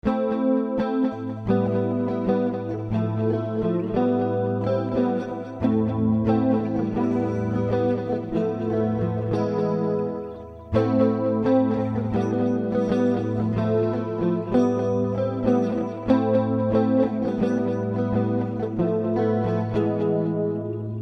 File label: Epic, neoclassical metal